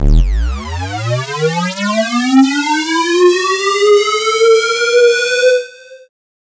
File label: FX UP; neuro; Stanza